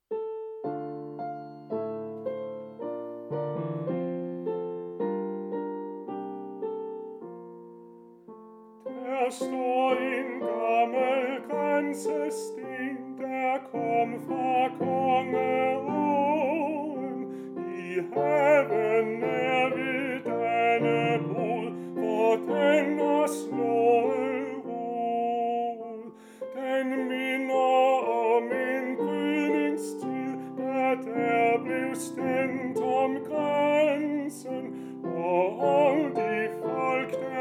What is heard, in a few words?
tenor; der staar en gammel graensesten